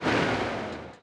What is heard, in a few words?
engines; World of Tanks